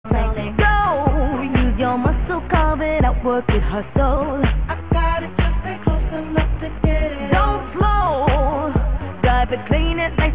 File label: disco